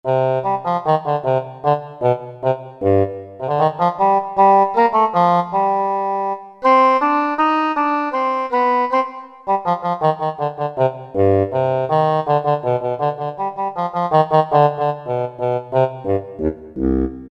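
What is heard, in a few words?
English Horn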